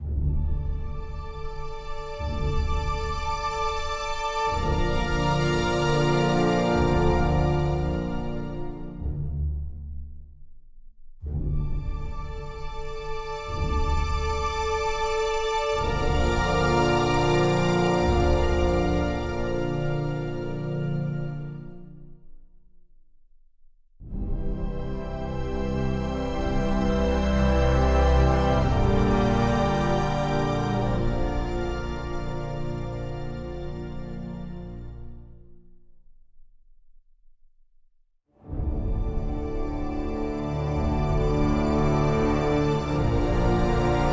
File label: amb no perc; lethians crossing; Tyranny Gold Edition